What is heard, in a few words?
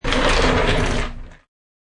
Short sound of a janitor's cart being pushed on concrete.; Rolling; Objects